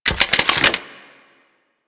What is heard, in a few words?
Goo Gun Reload